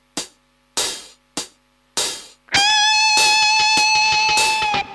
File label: vib